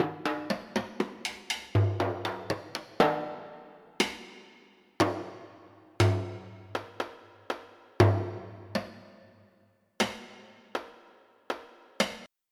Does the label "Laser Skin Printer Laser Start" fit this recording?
No